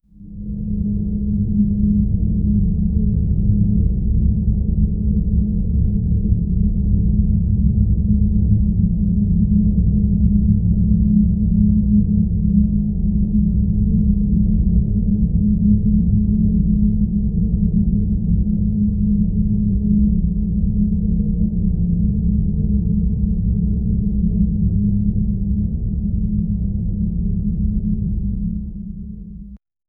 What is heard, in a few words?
low dark ambient sound ef